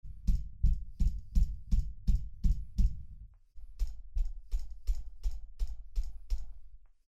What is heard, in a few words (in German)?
DIY Shockmount - AKG H100